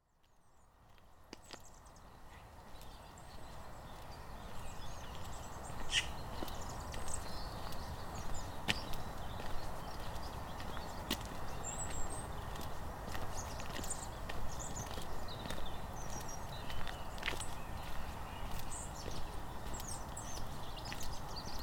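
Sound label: Frosty Marshes Soundscape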